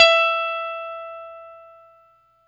BLUE POP, Guitar & Bass

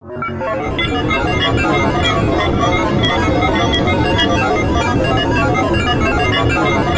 ATM SYNTH; Best Service - XXL Production Effects

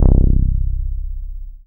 BAS MKS-50; Best Service Gigapack 2

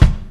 Kick, EMU PX-7 Drum Machines

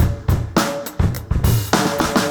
Crunchy, 104, Beat Loops; Zero-G; Monster Beats

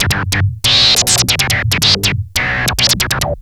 Treat Guit, Industrial Nasty

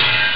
hihat; Amiga Soundtracker Sample Packs

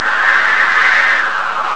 low wind; Amiga Soundtracker Sample Packs

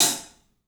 club hat; 3D Sound; 3D Drums

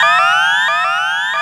ALERT, SF EFFECTS; Q Up Arts; Dream Experience

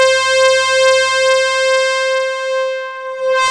SYN, Synthesizers; Soli-Music; World of Synthesizers VOL-1

